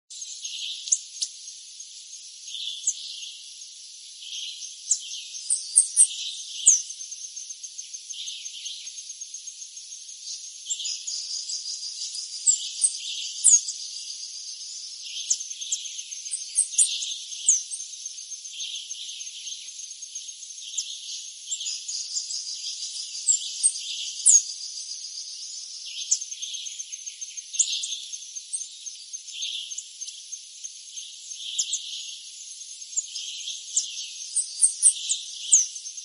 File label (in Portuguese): canto da saira douradinha